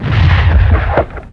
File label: Impact; Falcon4